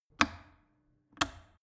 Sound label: plastic water boiler turn on off